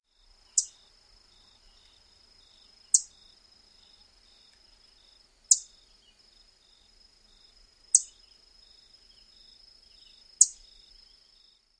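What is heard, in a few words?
alarm chips, Northern Parula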